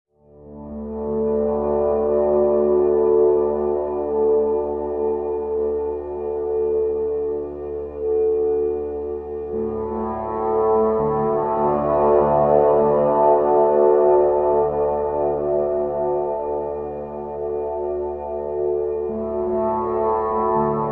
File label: middle eastern darkness drone; sonniss gdc